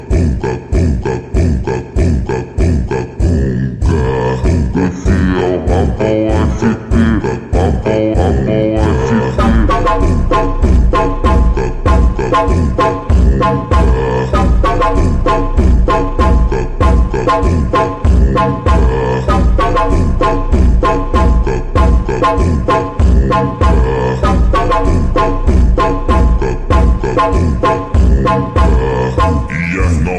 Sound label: conga conga ringtone